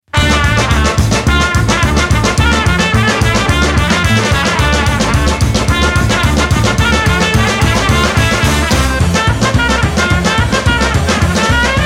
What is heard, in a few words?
punk polka